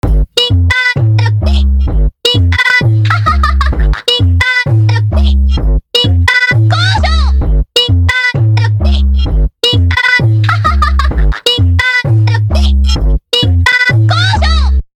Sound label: beat